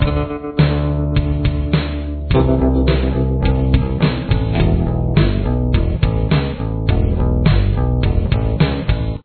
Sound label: verse part 3, guitar and bass sound like together